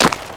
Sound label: creep footstep; Radiant